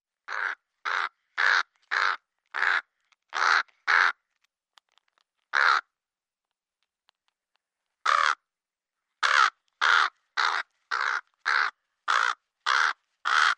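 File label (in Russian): крик вороны как карканье старой карги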